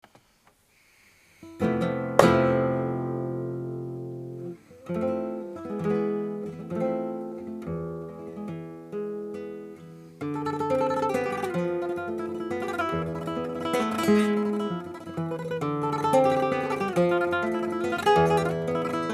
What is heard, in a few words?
solea tremolo